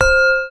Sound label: bell; openspace3d; kinectdemo